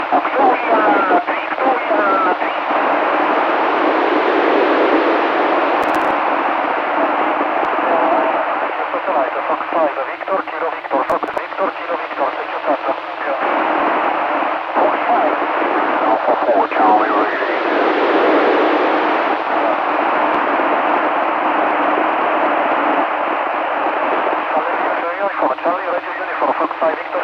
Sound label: ao-85 145mhz980